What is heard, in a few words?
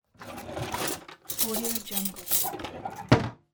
grabbing silverware from drawer